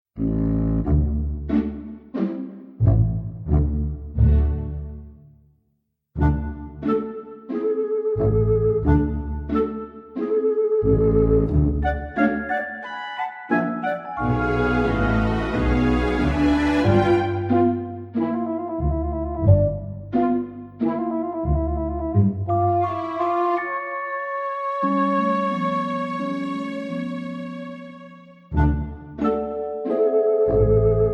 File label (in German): waldspaziergang blues; zwei weihnachtsmaenner